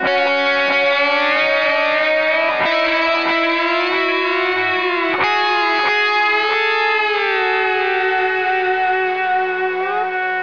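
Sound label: Slide Guitar; Sonic Foundry (Sony Creative Software); Crimson Blue and Fabulous Horncraft 4 RnB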